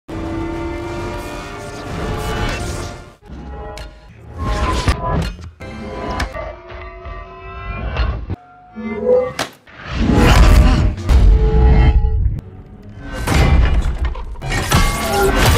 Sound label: Hammer; Hammer of Thor; چکش ثور